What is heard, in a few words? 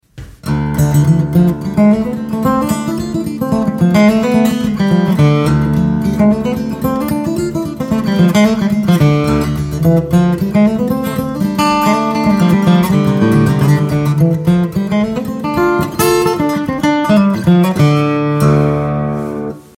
guitar